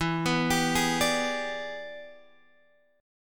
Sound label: E 7th Sharp 9th Guitar Open G, chord